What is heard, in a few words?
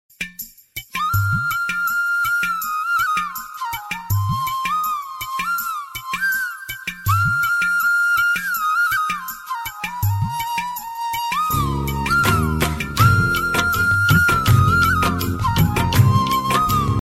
huawei remix